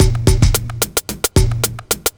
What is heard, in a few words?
block rock kit